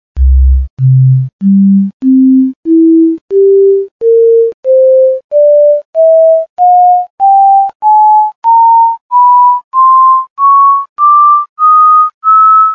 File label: harmonics